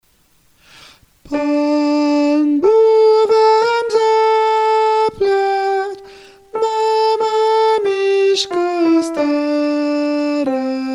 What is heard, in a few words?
soprano; pan buh vamzaplaf